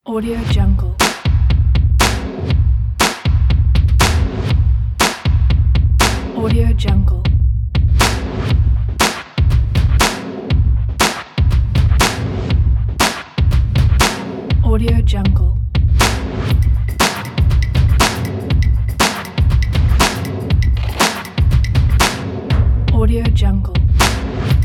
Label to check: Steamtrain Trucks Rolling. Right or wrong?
Wrong